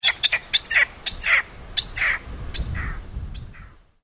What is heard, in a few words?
red legged partridge